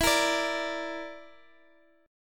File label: D Suspended 2nd Sharp 5th E